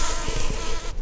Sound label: bumblebees, Pascuorum queens